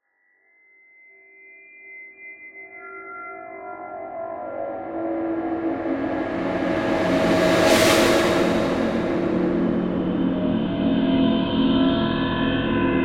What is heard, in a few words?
Composition Distort, Production, Element, Composition, Distortion, Feedback, Swells, Eerie